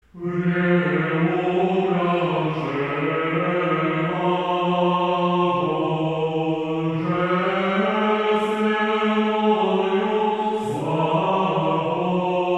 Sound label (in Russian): Успение 1 глас ирмос 1 песни; Преукрашена